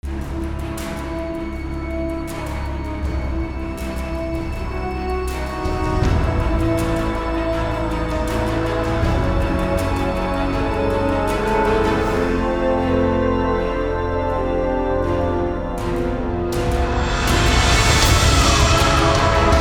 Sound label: Loading Screen; Shangri La